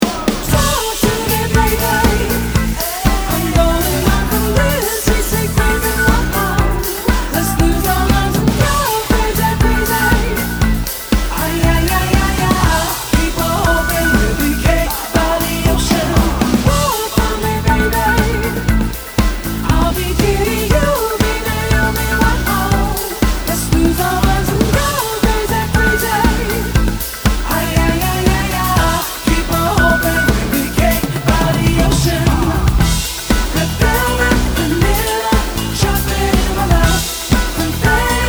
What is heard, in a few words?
Rock Pop Band; Staffs